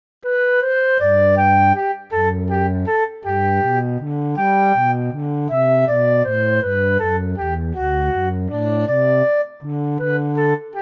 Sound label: Flute Cello, instrumental; I am using the flute voice (playing down low) for the cello.